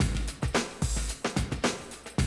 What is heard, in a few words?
110, Classic Drum Loops